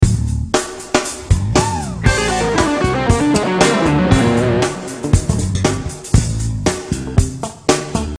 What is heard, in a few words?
Lick